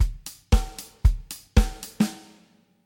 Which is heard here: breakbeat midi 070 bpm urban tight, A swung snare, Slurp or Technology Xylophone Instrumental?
A swung snare